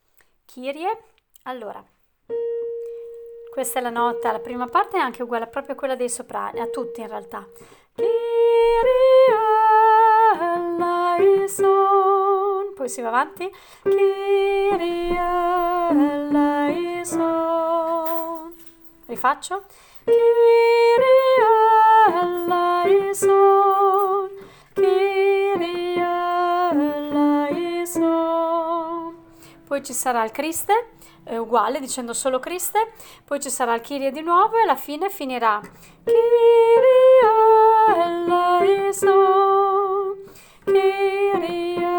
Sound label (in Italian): kyrie contralti